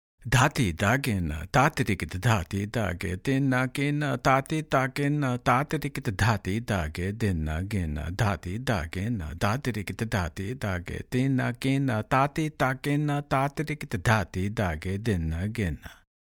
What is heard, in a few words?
Dhatidhagi Nadhatirakita